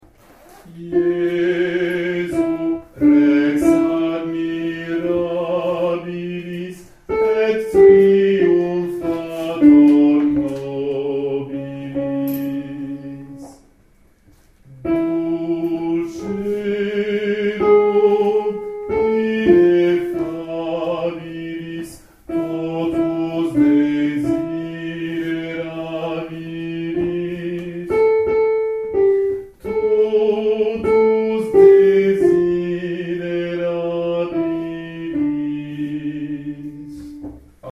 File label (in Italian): Contralti; JESU REX